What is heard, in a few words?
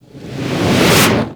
Environment Explosion; Gameplay; Warframe; The New War; Mission Four